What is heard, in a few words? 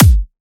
Dubstep Kick; Ghosthack; Dubstep Sound Arsenal